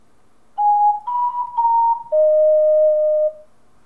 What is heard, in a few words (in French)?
geai moqueur, ocarina